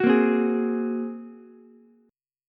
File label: Bb 7th Suspended 2nd Sharp 5th Bb Ukulele, chord; 11,10,8,11; Bb7sus2#5 strummed; JGuitar